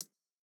Jrock hihat

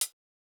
hihat, house

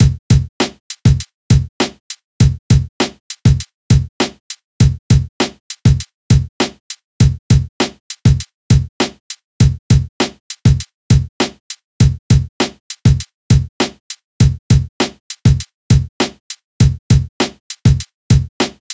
Hiphop loop

